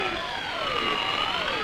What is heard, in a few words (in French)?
Jauge Loop Short; Puddle Plus